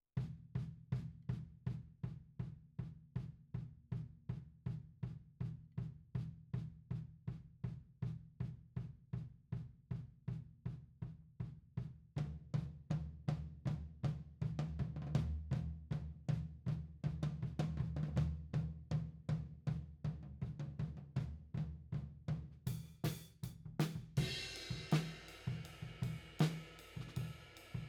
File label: Tom, 160 BPM